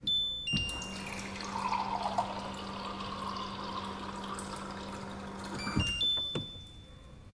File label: water dispenser; Golden Crayon Sounds